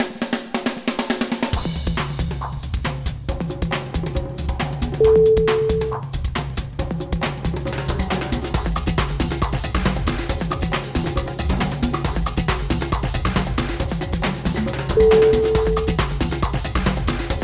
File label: sport drums for football